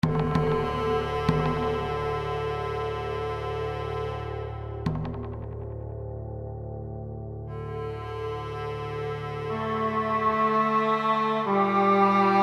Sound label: Two Birds Simul, Birds Strumentale MIDI